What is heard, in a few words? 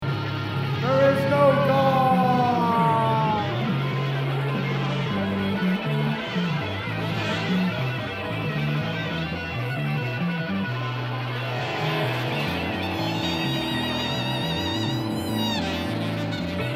Noise